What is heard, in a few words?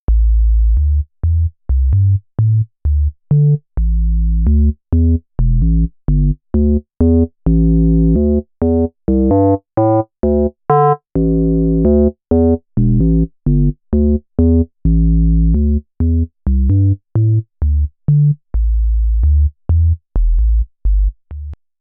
pwm sine